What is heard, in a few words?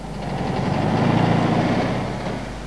beetle